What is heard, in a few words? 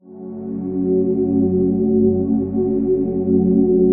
122 BPM Pads Amaj Fmin Tonic F, ambient synth pads; MMB